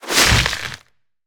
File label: Web Sling Whoosh; Lurker; Evolve; Gorgon